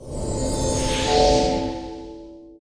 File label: buff, battle